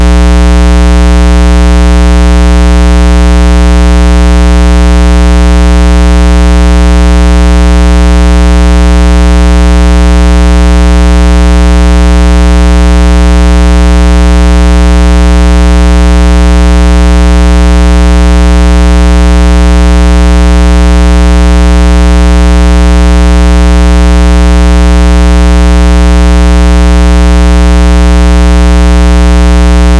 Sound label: 60Hz Rect